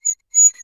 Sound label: Glass Scrape On Glass; Foley